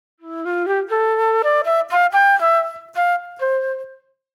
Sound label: Reflective Flute